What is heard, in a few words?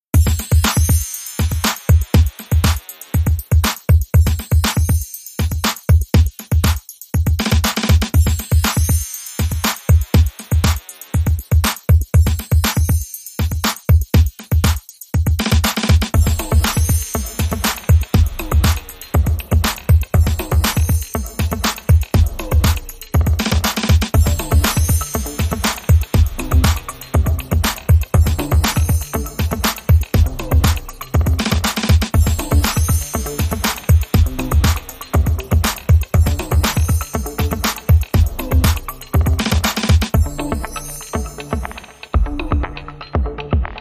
The Baritone No Melody